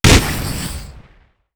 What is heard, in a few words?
explode; g-toilet nextbot; GToilet SKIB FANMADE